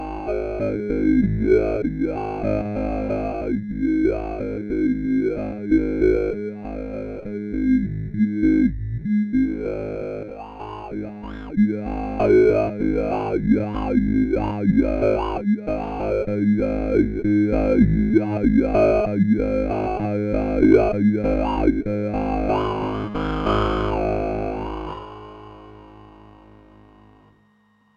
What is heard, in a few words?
flat strings flat voice